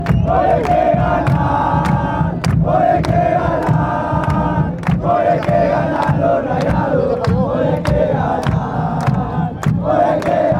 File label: ole, chants